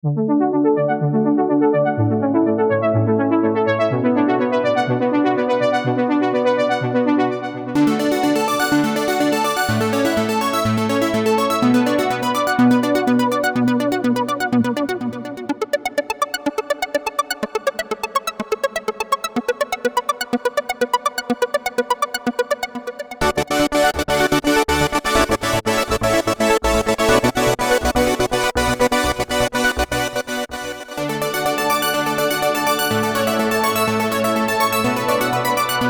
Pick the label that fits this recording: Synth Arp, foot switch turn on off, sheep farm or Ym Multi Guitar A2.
Synth Arp